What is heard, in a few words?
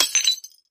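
Craft Vase Type 4 Crash, housewares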